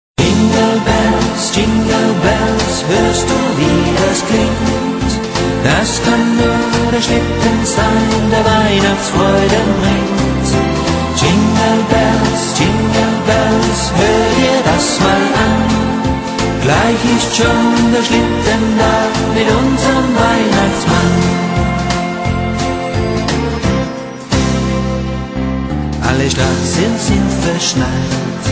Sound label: Jingle Bells